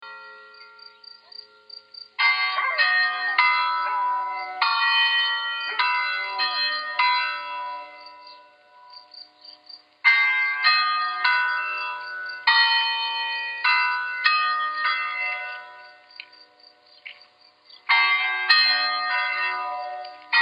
a howling dog church bells and birdsong in the pyrenees